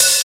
clear open hi hat sample f sharp key, Royality free open hi hat one shot tuned to the F# note.